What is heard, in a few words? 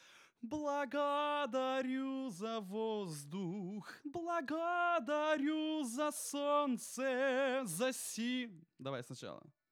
vocal